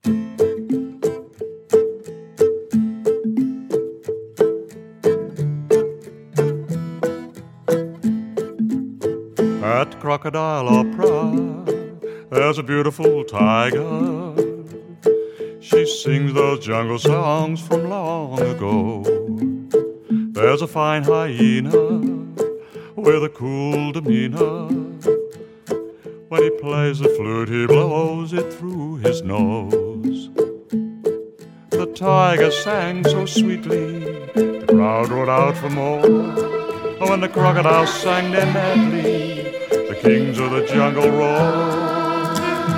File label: Vocal